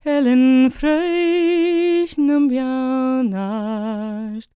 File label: GAELIC, World Voices, GAELIC FEM; ILIO; Vocal Planet